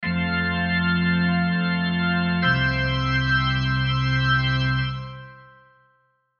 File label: Organ Church Amen, Organ Church Amen Cadence Block Chords; Keyboard; Blastwave; Sound Effects:Musical